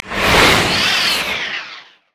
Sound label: teemo move quick; LoL; super teemo